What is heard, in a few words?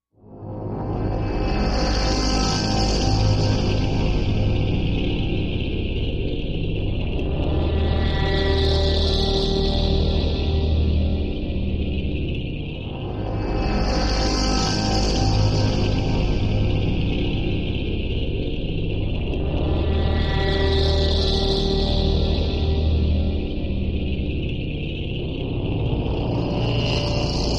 Scarab Orchestra Textu, musical